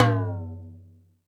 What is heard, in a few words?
Toms, Drum Machines E-MU Pro-Cussion